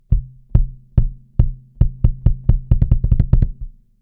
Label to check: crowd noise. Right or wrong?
Wrong